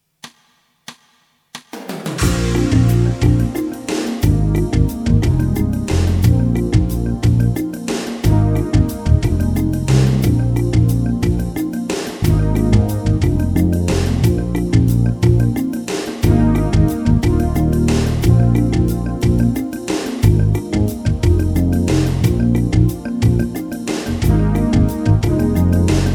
Mixolydian, 3/4 fusion groove illustrating Mixolydian